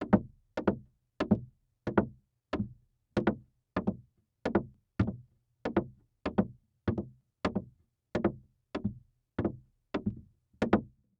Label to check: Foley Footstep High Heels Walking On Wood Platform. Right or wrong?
Right